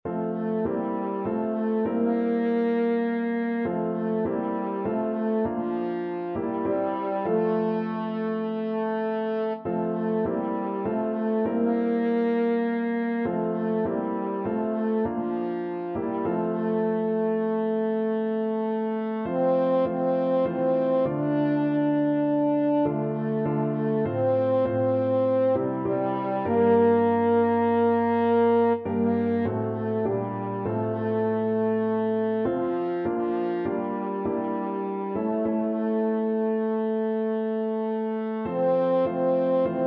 french horn

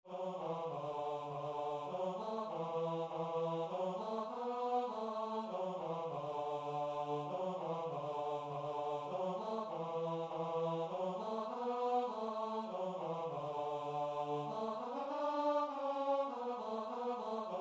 come thou fount, guitar and vocal

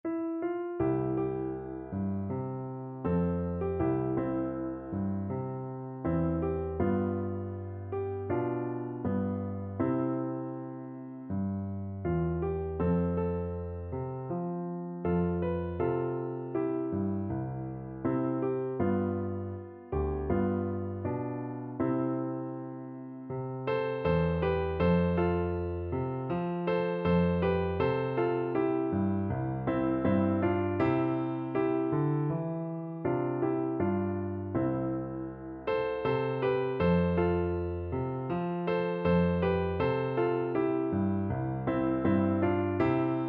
ribar plete, piano

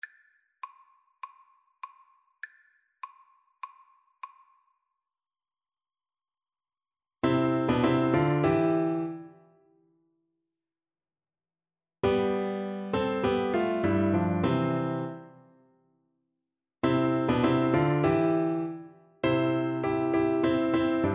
Alto Recorder

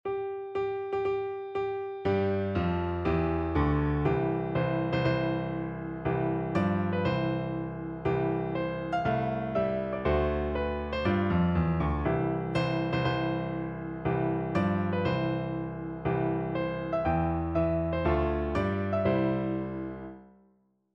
wedding march piano; wagner

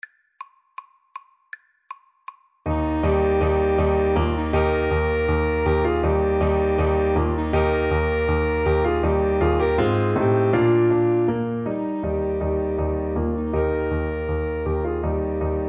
spring easy, Alto Saxophone Duet

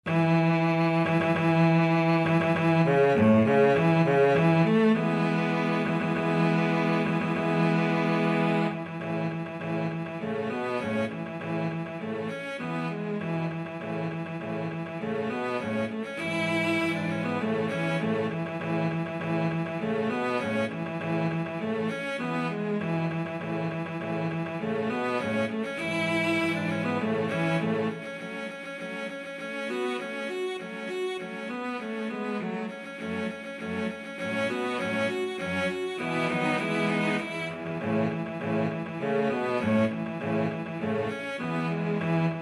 cello quartet

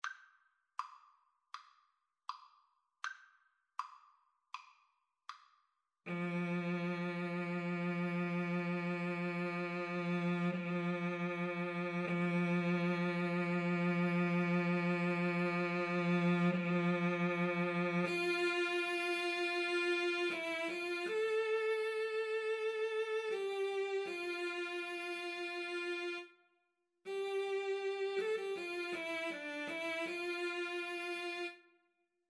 Cello Duet

